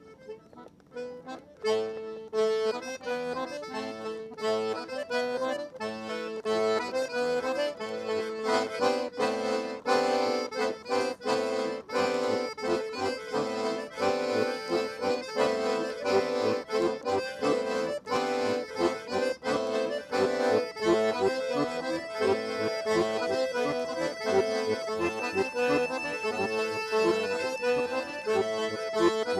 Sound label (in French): accordeon; festival